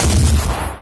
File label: punch; sticky fingers